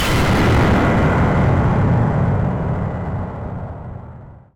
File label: shock